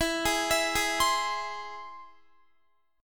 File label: E Augmented, Guitar; arpeggiated